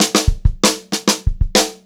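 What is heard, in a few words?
STRAIGHT FILL, Funk-Groove Drumloops; USB Soundscan vol.38; AKAI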